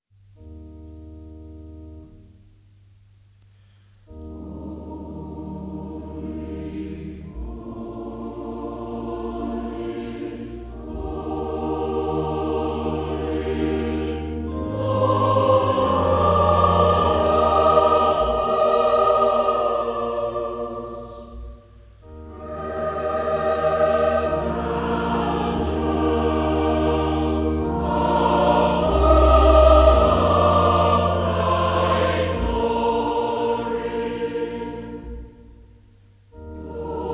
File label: Choir, hymns